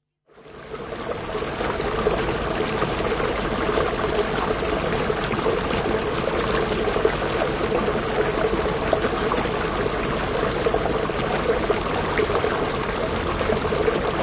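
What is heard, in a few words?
stream of water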